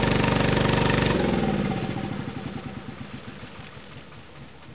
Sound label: nautical